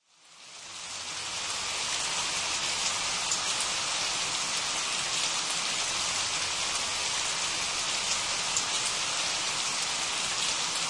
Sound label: Refreshing Rain; Sleep Sounds of Nature